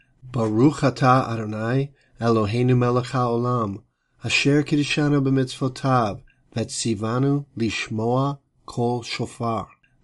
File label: shofar blessing; Blessing Cards